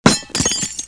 glass crash; PUZMANIA

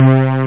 string

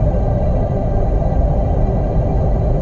engine; Earth and Beyond; EA Games

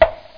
Caw Beep

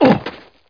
land; Daikatana; superfly

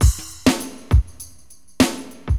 Drums, AcidJazz Funk

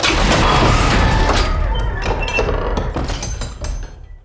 door open; DAoC; Toa

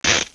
Stretch; Princess and the Pea; SoftKey

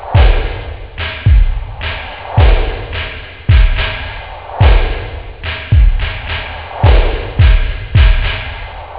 beat, instruments, wave, loop, house

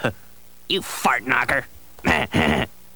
fart; The SoundBlaster Multi Media Experience vol 4

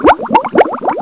blub blub; PUZMANIA

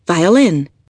violin

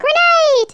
grenade; Worms2

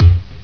kick; spectrew

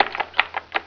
locked; outlaws

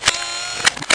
SLIDE

